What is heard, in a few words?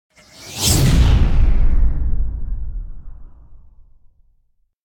Whoosh Fire By - Trailer Element; Hollywood Edge; sound designer's toolkit v2